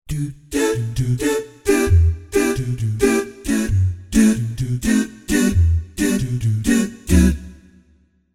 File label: Doot Guys, Jazz Group; Jazz Group Multisamples